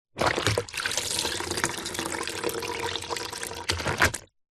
household mop in plastic bucket pull out drips

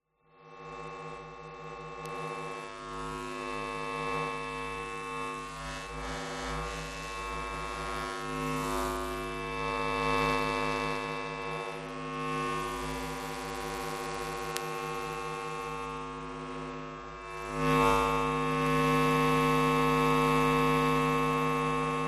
electromagnetic power station power supply

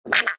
cartoon squelch wet poop fart duck like; zapsplat

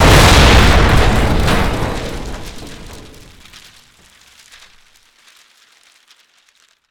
Bank Safe Explosion; Blastwave FX

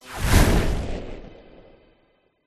Fire Spell Torch Of Light; sound spark

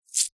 animals dog leash metal chain place into hand; zapsplat

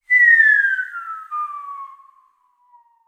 cartoon whistle falling human; zapsplat

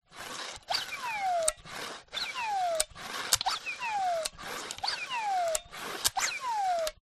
transportation bicycle pump whistle operate

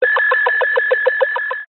science fiction transmit error burst; zapsplat

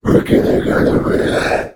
Demon; little robot sound factory

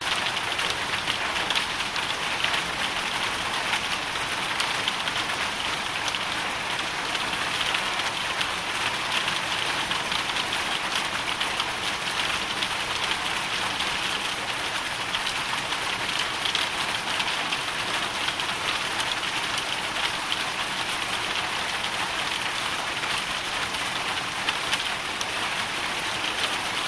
hail shower loop

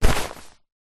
jacket safety thick fluro hi vis pick up; zapsplat; foley

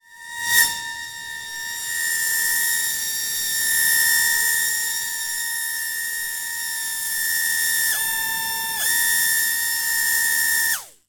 leisure toy drone nano hover overhead

